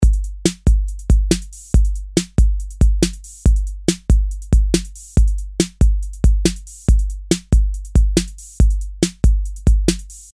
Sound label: PreAmp + EQ